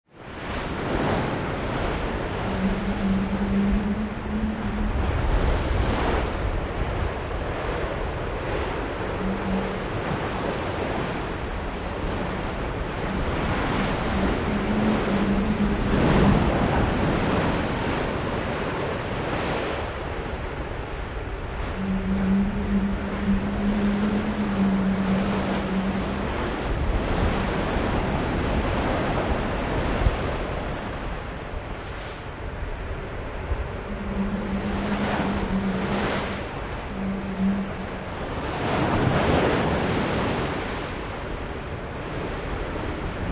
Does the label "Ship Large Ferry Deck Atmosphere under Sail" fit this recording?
Yes